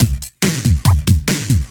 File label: Breakbeat; Vengeance Samples; VEC2 Loops